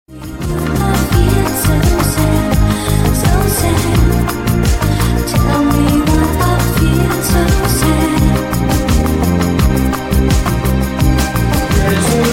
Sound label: sad ringtone